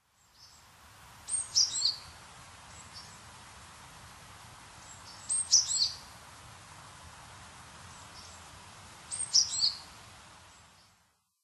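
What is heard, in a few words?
Japanese tit, kokubunji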